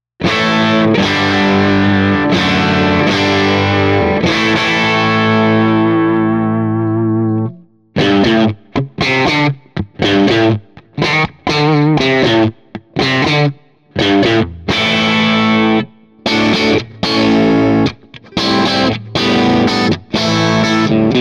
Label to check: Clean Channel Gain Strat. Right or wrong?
Right